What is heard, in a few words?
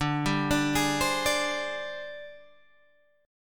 Ab Major 7th Flat 5th D